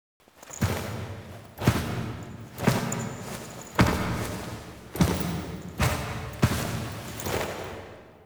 Foley; Warframe; The New War; Cinematics; Lotus Gem Part Two; Drifter

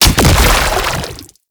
Grenade Explosion; Warframe; Lasrian Soldiers; Weapons; Lasrian Chem Nox

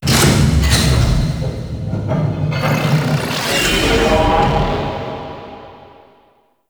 Hack Completed; Warframe; Entrati; Vaults

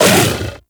Kubrow Armored Vocal Attack Short; Warframe

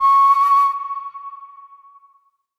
Flute C Sharp; Player Instrument

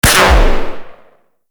Sentient Pistol Shot; Warframe; Amalg

